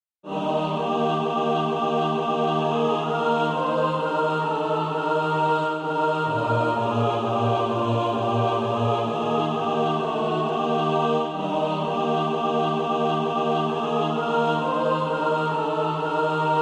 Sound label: Ye Must be born again mixed